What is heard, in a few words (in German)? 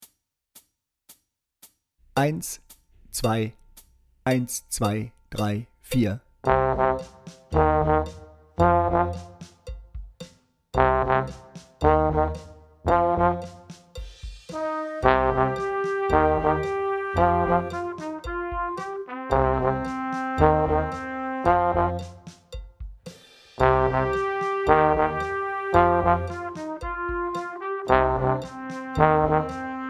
Heavy Metal Posaune lauter